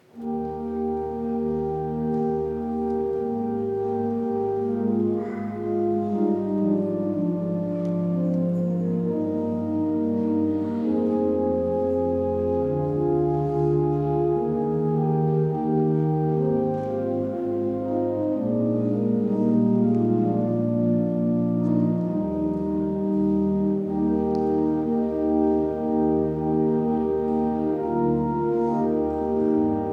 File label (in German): Orgelausgang; Orgelstück zum Ausgang Eric Georgi (Zwickau-Planitz); Gottesdienstes vom Sonntag Miserikordias Domini 2026